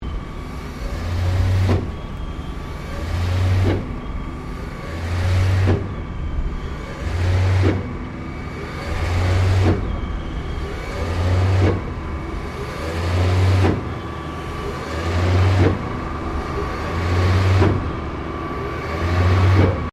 Cement pump cleaning cycle loop, Industrial cement pump; Construction Vehicles